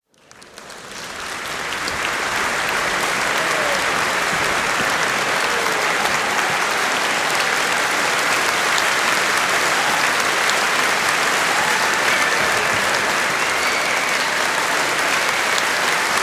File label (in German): Applaus Applaus